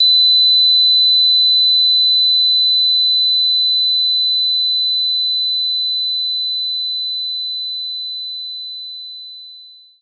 Siku C6; knights try

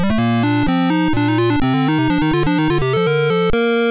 Game Start; Ms. Pac-Man; master system

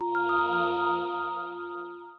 Air Dash Flower Hat

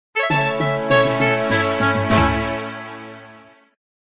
Fletching level up

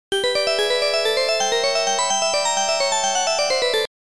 Round Start Music; Libble Rabble

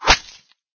Arrow Hit

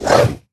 Hunting Dog Bite; Linirea

